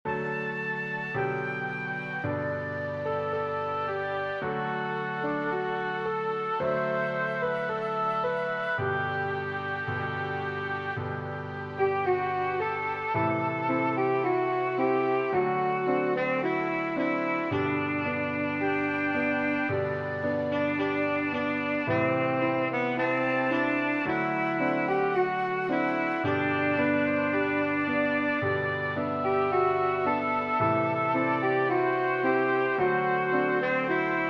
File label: Sax E